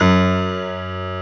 PIANO GB1; St.Piano&Strings

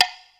PC, Casio CTK-5000

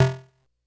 Snare, Drum Machines, Korg DS-10

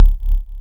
Vactrol Kick, drum machine; 300 Drum Machines; DevSnd

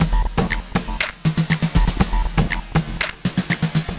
Drama Drums (120BPM); cinematic drama; Loops; musicradar

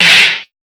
Snares, Alesis Performance Pad

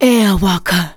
vox, Techno Trance; Ueberschall

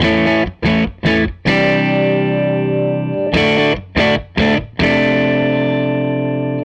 Les Guitar Loop

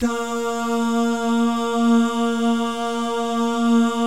DAH, Vocal Jazz; ILIO

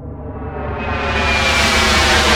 Korg, FX

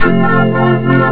organ 2nd inv maj; jazz jackrabbit 2; M8

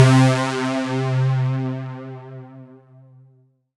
C4, Synth Line; 90 s Sample CDs; NiceBeats; SynthLine VOL-23

